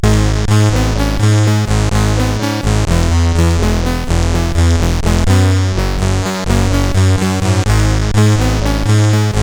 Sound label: intro, sid CSG8580R5